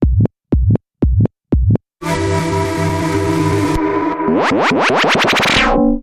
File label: Transit Sci Fi Bumper Reversed